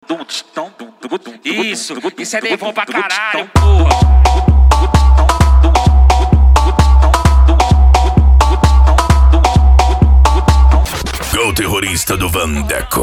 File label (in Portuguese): dance e funk mega bass